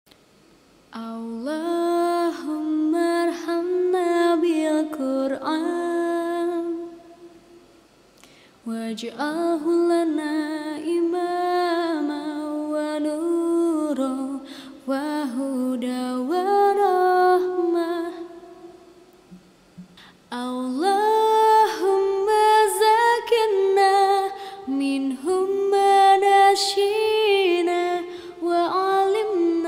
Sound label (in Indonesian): lagu anak islami doa khatam alquran cover